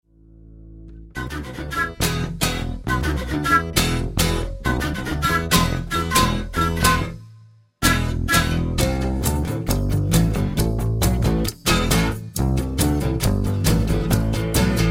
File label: Acou, Flu, Bass, Mar Tam